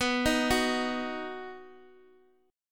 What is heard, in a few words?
B-Major B down Guitar Open D